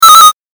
8bit アラート

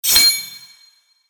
斬撃

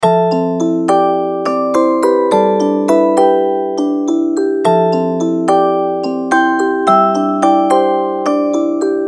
ミステリー オルゴール

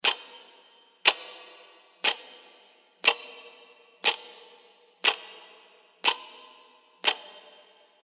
置き時計の秒針 響き付き; 置き時計の秒針の音8回分響きあり

